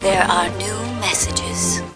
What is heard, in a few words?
New Mail Sound